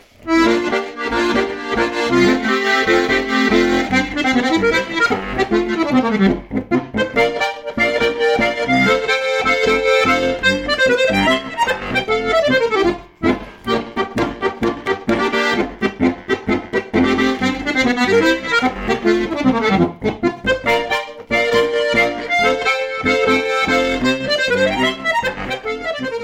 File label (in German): Polka, Akkordeon; Ziachorgl